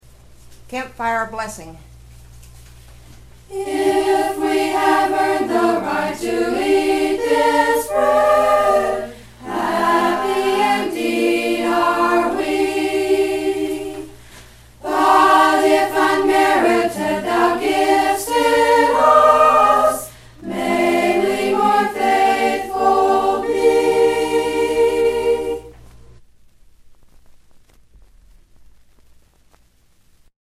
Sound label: Campfire Blessing